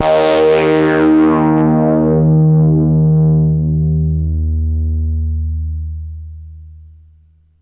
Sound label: D sharp 2, nasal hard synth